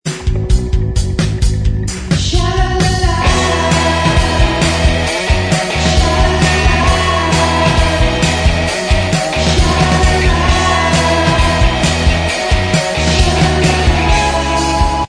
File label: Bvox Track